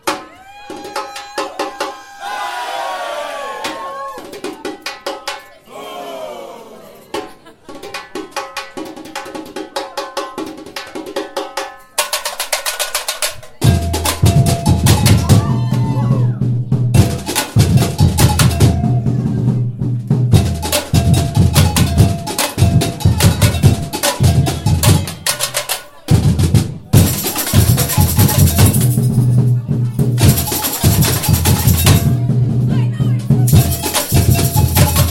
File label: Samba intro; Salguiero